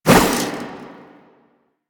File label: Staff Swing; Warframe; Duviri; Dax; weapons